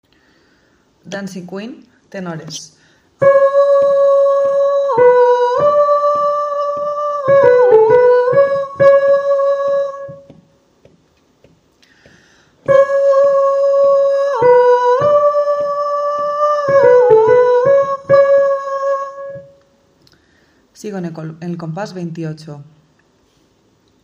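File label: tenor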